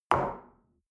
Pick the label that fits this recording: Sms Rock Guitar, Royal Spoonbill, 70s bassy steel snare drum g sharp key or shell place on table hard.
shell place on table hard